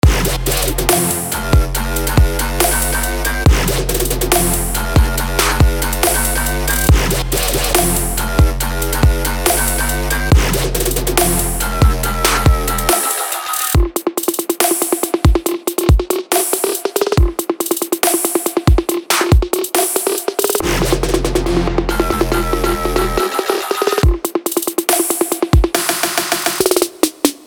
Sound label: Kitchen F 140 BPM Trap